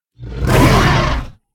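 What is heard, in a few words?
Terror bird Attack Bite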